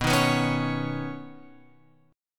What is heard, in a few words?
B Major Add 9th B, chord; Badd9 Chord {7 6 9 6 X 9} strummed